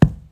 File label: wall pillow; Golden Crayon Sounds